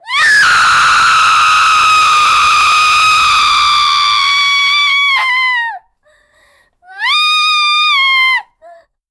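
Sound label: russian teenage girl scream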